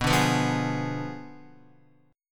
B Diminished 7th, Guitar; Bdim7 strummed; JGuitar

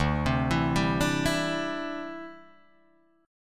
D 9th Suspended 4th D down Guitar Drop D

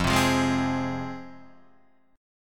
F Minor, down, Guitar, Half Step Down

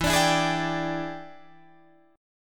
E 7th Sharp 9th Guitar Open D, chord

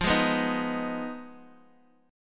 F Minor 7th Flat 5th, Guitar, DADGAD

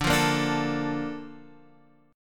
A sharp Major 7th

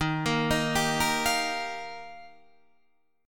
D sharp Major Add 9th, Guitar Open D

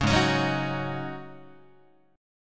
G sharp 7th Suspended 4th G sharp, Guitar

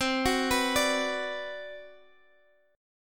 B Minor C; Bm/C arpeggiated; JGuitar

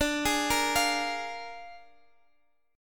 Bb 7th D, chord

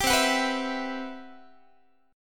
C Major Sharp 11th, C, down, Guitar; CM#11 strummed

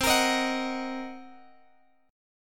C Minor 7th Flat 5th Guitar Open G, chord

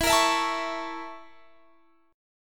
Bb Minor Major 7th Flat 5th

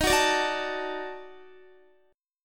D sharp Minor Major 7th Flat 5th, Guitar; strummed

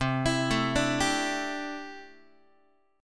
C 6th Add 9th, C, down, Guitar; arpeggiated; x,8,7,7,6,6; 4A,4E,5A,5D,5G#,6C#; chord; JGuitar

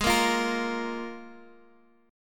F Minor Double Flat 5th

